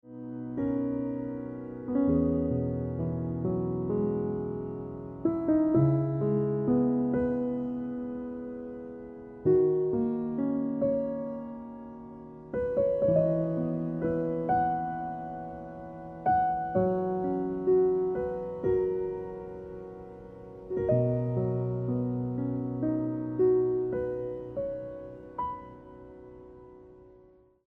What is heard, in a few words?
Ringtone